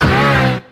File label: driveby, Stabs